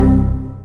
windows xp critical error

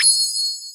anime magic sound effect

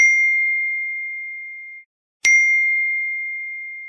ding; imessage

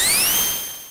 spindash; sonic

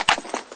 weapon gun pickup sound; half-life; counter-strike

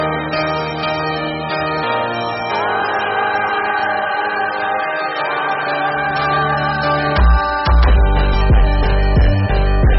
aura phonk

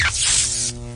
electricity charge sound effect

